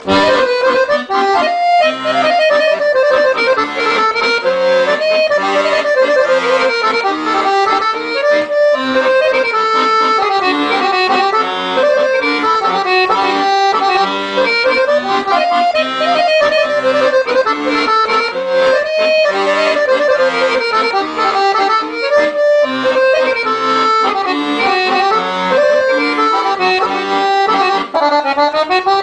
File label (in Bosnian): miljacka harmonika